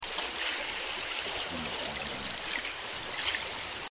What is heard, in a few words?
descending grumble, orca call